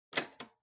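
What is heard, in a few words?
Portable Stereo Tape Fast forward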